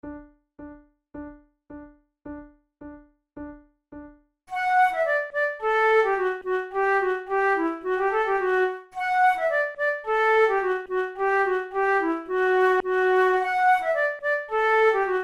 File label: quick step duet, flute